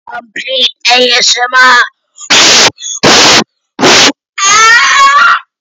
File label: asmr